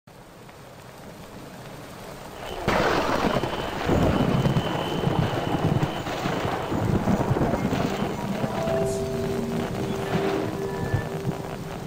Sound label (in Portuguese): calmaria